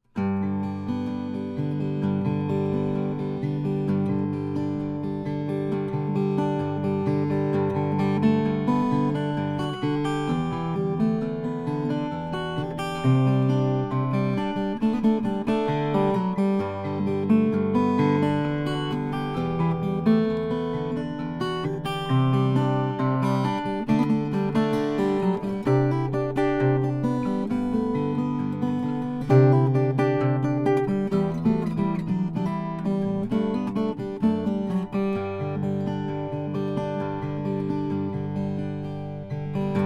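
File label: rainsong pa1100ns carbon fiber, guitars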